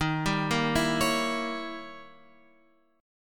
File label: D sharp 7th Flat 9th; D#7b9 Chord {11 10 8 9 X 9} arpeggiated